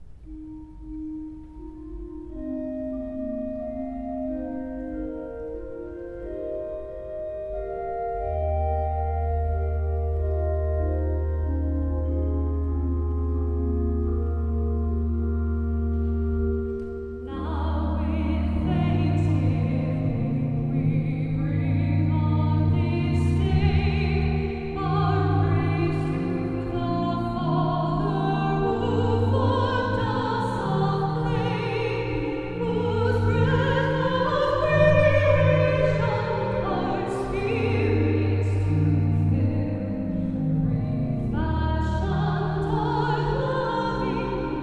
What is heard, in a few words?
FEMALE